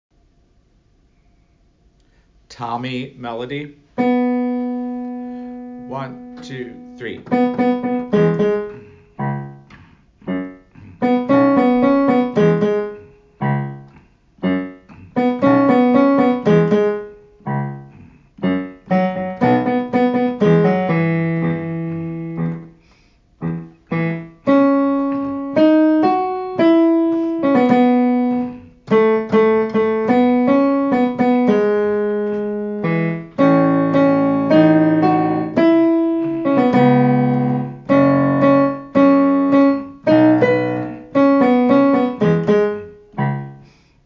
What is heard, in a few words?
MELODY